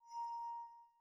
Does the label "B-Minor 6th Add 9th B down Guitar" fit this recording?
No